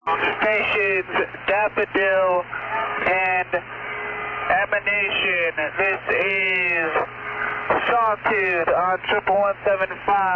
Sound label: saw tooth